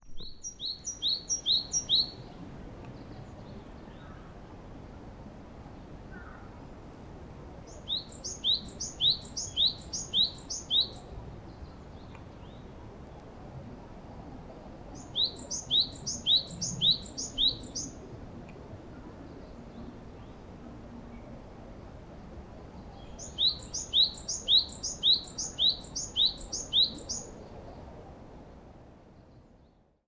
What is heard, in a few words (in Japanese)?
shijyukara, call